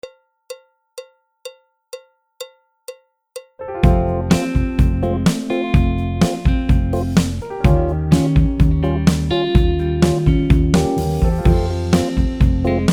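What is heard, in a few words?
backing track, Jazz; alto, tenor, trumpet, trombone, piano; Jazz Syllabus Level 3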